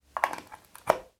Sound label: Powerpoint Plug In